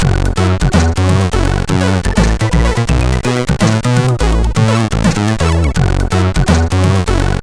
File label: sid CSG8580R5; Owen 049